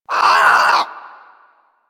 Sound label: Bird Of Prey Vocal Scared; Characters; Warframe